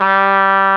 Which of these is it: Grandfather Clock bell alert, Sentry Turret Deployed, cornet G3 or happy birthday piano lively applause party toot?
cornet G3